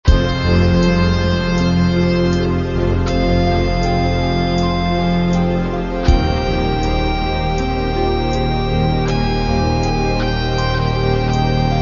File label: instrumental